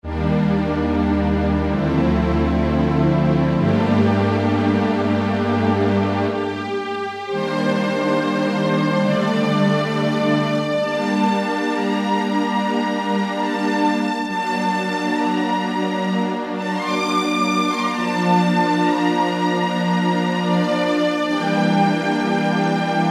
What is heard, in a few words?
String Cue, Korg N364; Factory Combi demos